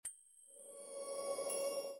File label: bloom chime